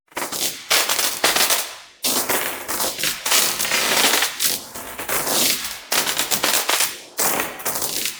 Loop Lightning; KriptoFX; Realistic Effects Pack v1; PARTICLES

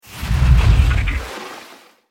In Fire; KriptoFX; Realistic Effects Pack v1

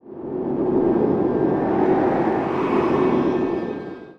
In Out Wave; KriptoFX; Realistic Effects Pack v1

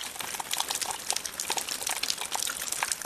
Loop Blood; KriptoFX; Realistic Effects Pack v1; PARTICLES

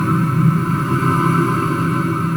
Loop Fire; KriptoFX; Realistic Effects Pack v1; PARTICLES

